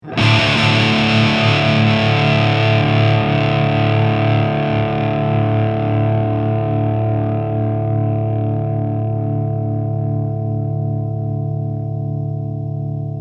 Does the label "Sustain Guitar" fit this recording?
Yes